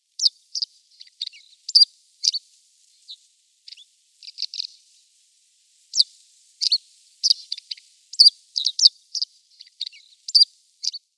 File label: Red-headed Bunting calls